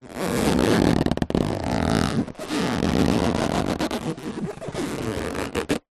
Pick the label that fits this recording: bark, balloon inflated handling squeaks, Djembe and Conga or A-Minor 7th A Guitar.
balloon inflated handling squeaks